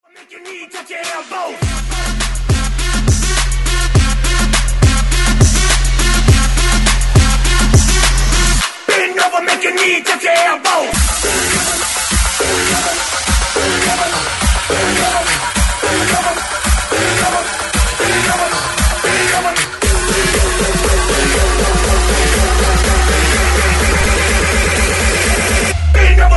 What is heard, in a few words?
128 BREAKMIX